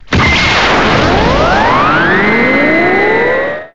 bigbang; db mod 15; superbuu

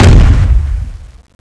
walk; CSO LightZombie

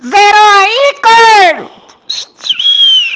knifeee

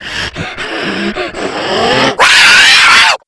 burn; cstrike; zombie plague

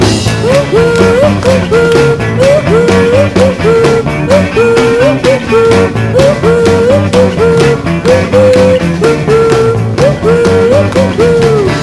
wooo; dod; hobo bronx

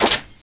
skill button

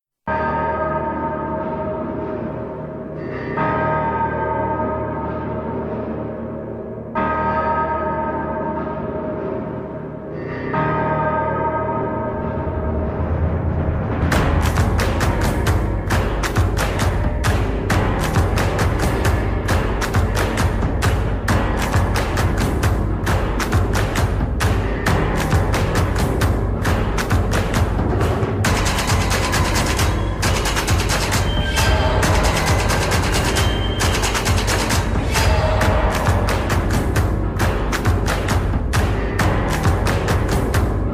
ambience run; cstrike; zombie escape

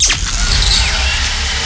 shock effect; cstrike

